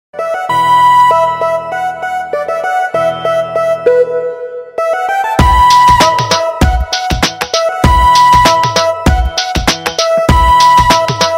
Instrumental Tone; Soggade Chinni Nayana; Telugu

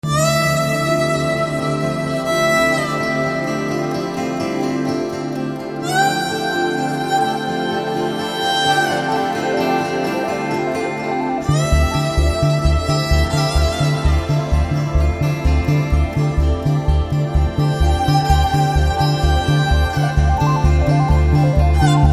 BGM Violin Tone; Jaanu; Telugu

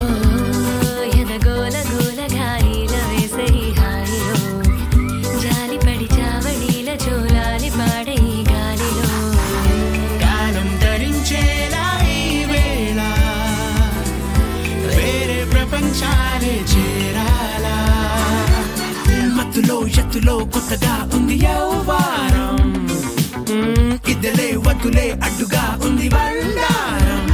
Female Ringtone; Raja Raja Chora; Telugu

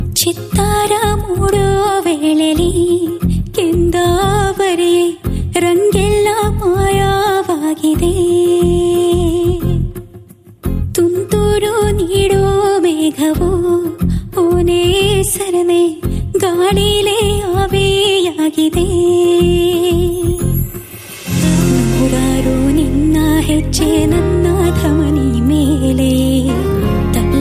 Unplugged Ringtone, Kannada; Ugramm

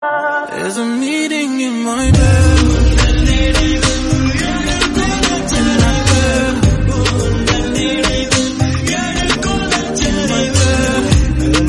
Male Ringtone; Tamil

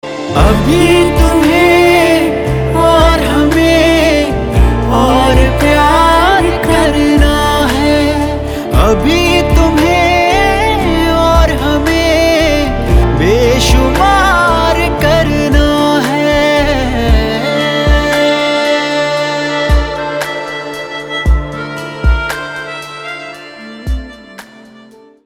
Male Version, Hindi

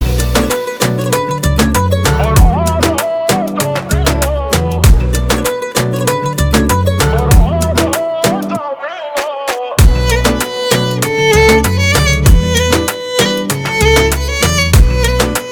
Instrumental Lyrical Tone; Faraar

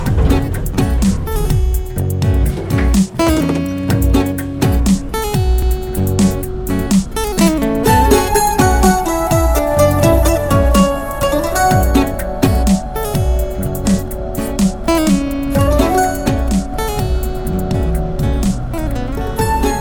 Falls In Love BGM; Adam Joan; Amy; Malayalam

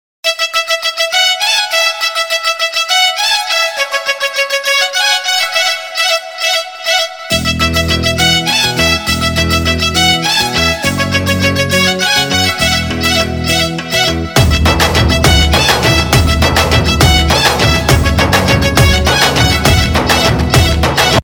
Instrumental Tone; Prem Ratan Dhan Payo

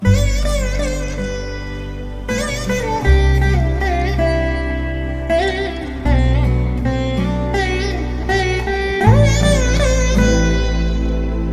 Love BGM, Malayalam; Varane Avashyamund

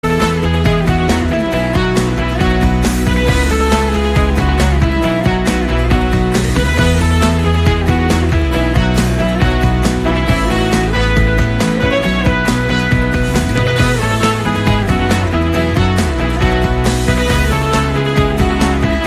BGM; Tamil

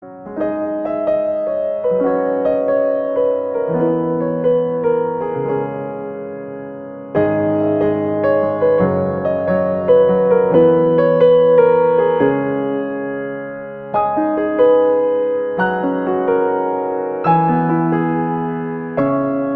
Piano Version Ringtone

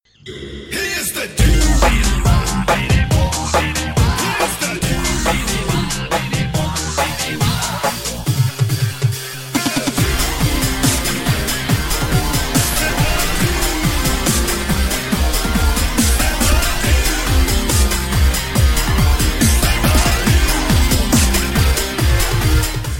BGM; Aadu Oru Bheegara Jeevi Aanu 2; Dude; Malayalam

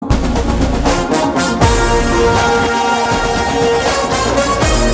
Trailer Roar BGM; Akhanda; Telugu

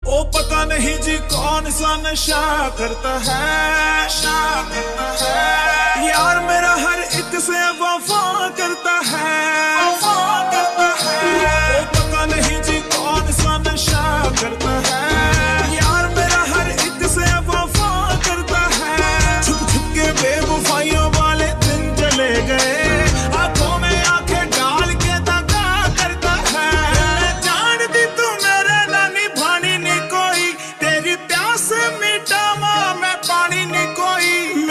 Male Version

